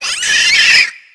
shielder idle; tf2pets; fortnite

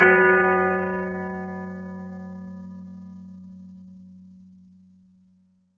bell, ambient

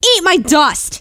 scout battlecry, femscout

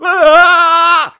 ieahhh

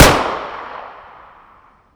pistol fire

